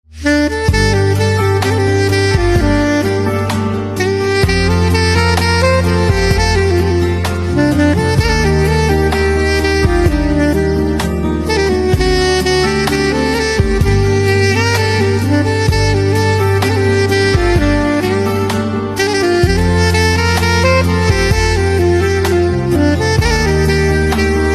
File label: Saxophone Instrumental Ringtone